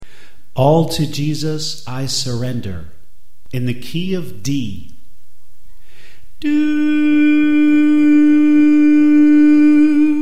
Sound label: Alto Left; All To Jesus I Surrender